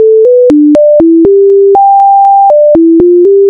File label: fast